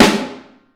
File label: Vintage Snare Drum